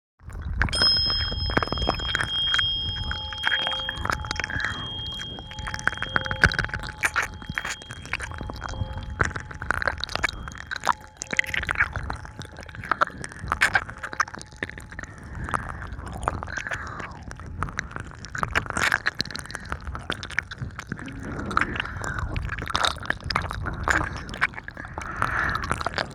音叉 ＋ 耳なめ; エンドレスc

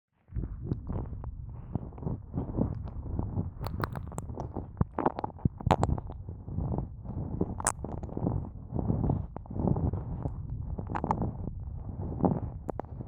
ぞりぞり耳舐め 左耳; 耳舐めコレクション

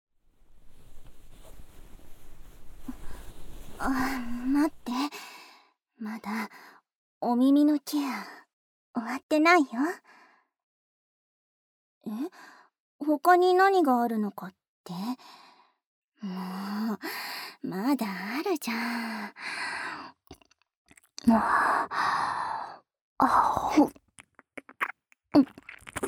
じゅるじゅるスク耳舐め; えろごろ

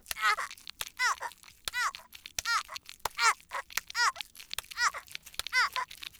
やっぱり気持ちいい

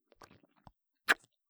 ちゅぽん

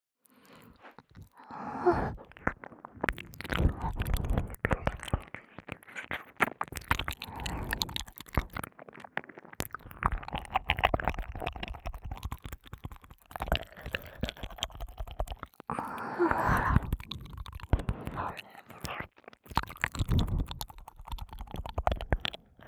(左) 耳舐め 高速 れろれろ; 耳の奥底で蠢いて～舐め音に溺れるバイノーラル～; 舐め音特化

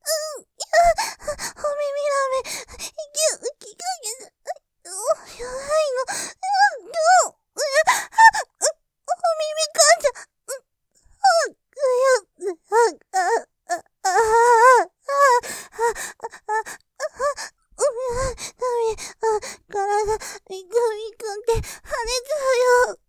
喘ぎ 感じすぎてヤバイ・お耳かんじゃらめぇ; モノラル; ループで楽しむ！シェフの気まぐれ抜き抜きコース

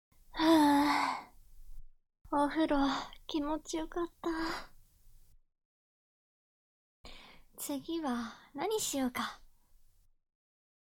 膝枕で耳かき, ASMR

